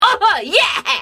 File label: polka oh yeah